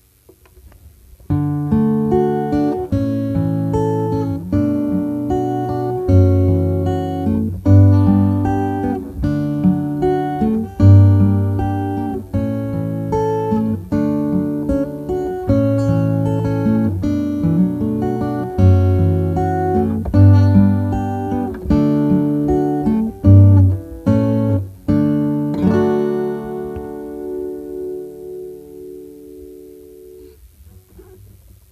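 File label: guitar arpeggio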